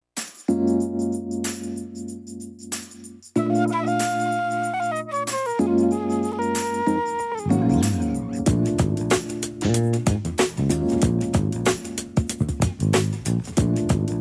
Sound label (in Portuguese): Trilhas